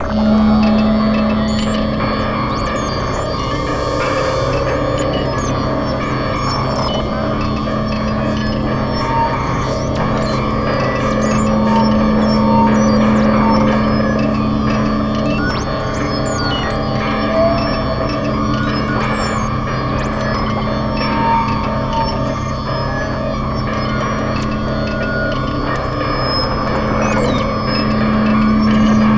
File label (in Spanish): Suma de loops mutantes